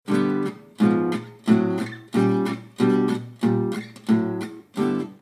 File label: pompe four practice, guitar